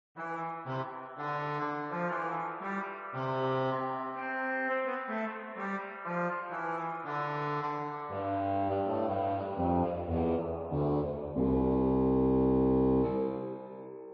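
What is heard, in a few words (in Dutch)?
trombone 8, orgel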